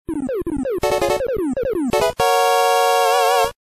Stage Clear

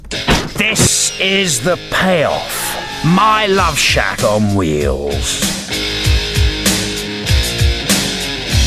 singing; Murdoc

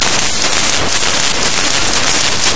melodious bird; animals

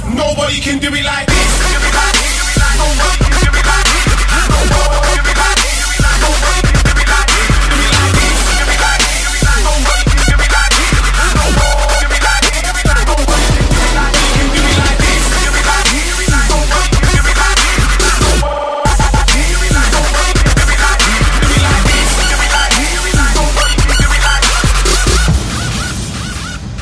Dubstep

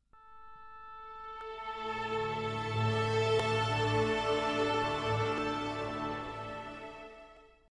playstation startup

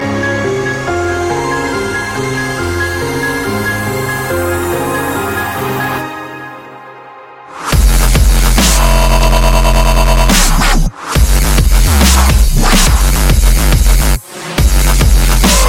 piano tune

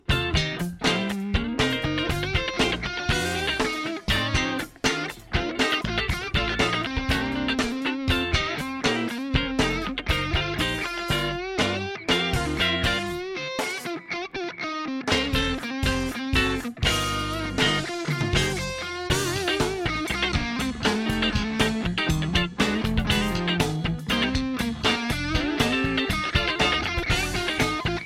rock slap; ringtones

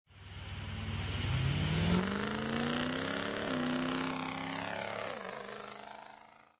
6.0L Powerstroke take off

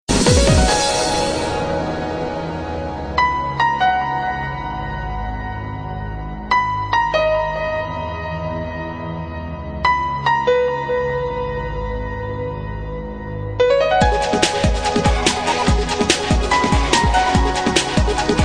remix, electronic ringtones

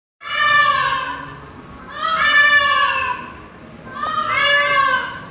peacock; punta cana

